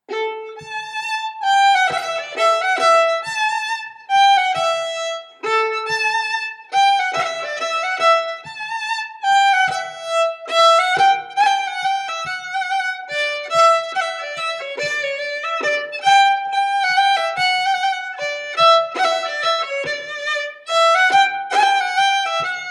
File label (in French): Montagnarde, 2nde melodie; Recueil Hainl; Montagnarde du Mont Dore